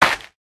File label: break, sand; block; Cubed